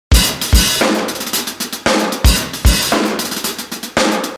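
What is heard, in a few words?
113 pop punk extra dirty loop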